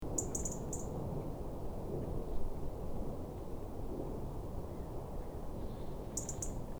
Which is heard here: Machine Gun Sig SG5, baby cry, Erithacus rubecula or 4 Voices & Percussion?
Erithacus rubecula